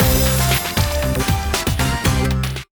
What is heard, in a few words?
free spin init; Amatic; Hot Scatter